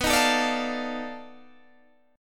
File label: B 7th Sharp 9th Flat 5th, down